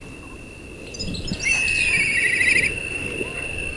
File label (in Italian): Nibbio bruno